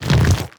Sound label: regular, zombie blind, foley; creatures